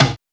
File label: TOM